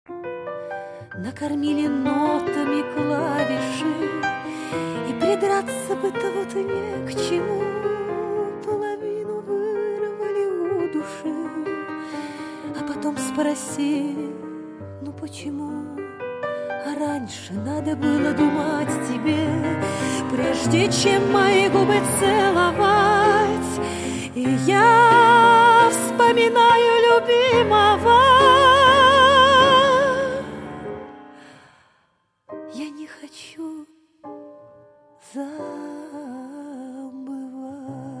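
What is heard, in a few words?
Klavishi rington, keys ringtone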